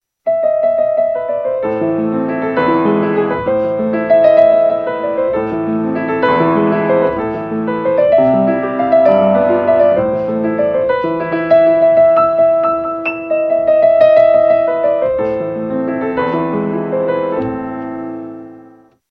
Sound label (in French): piano droit; instruments